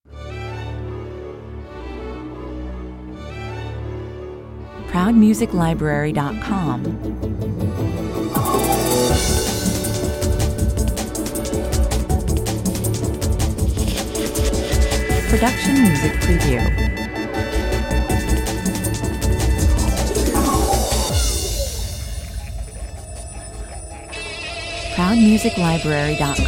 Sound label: 160 bpm, Starting of with a light classy string passage